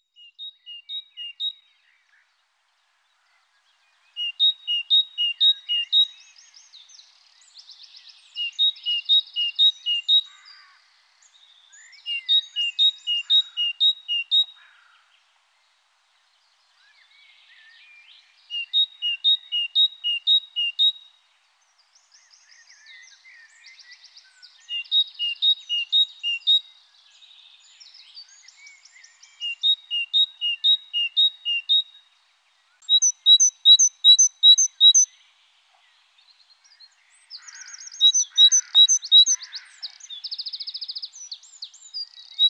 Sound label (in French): Mésange charbonnière